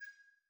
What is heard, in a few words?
F-15 Cockpit; DCS